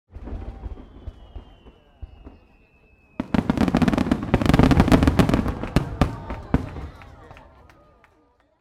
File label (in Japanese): natu